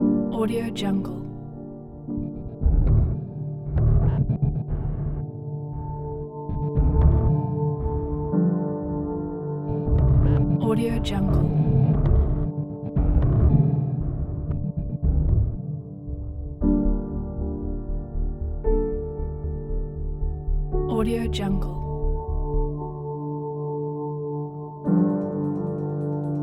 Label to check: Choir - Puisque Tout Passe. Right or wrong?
Wrong